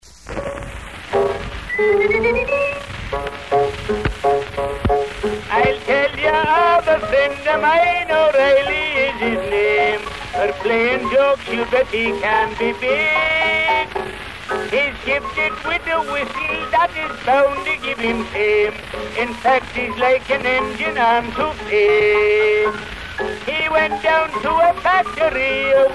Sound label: Whistling